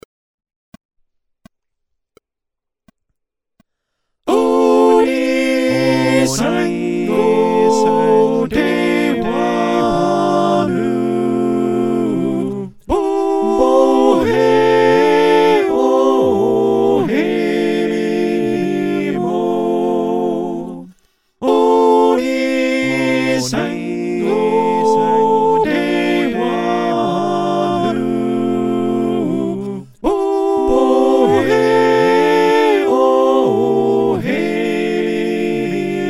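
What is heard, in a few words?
soprano alto tenor bass, choir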